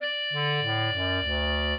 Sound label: clarinet; Mozart